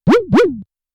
dog voice

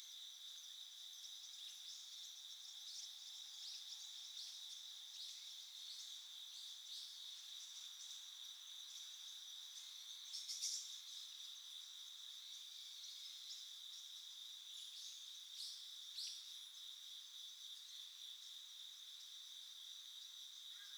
insects and birds

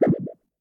pop up; 落下音・はねる音

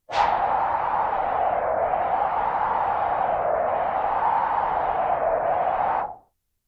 High Wind

